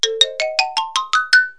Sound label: Wood Xylophone Hop Up; Music; Comedy Accents